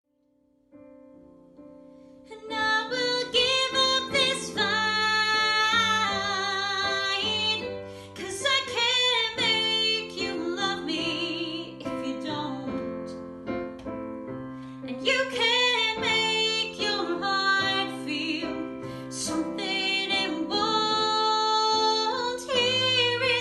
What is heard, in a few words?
Piano